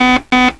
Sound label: ERROR